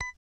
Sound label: device beep